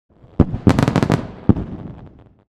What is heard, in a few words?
Fireworks display explosions short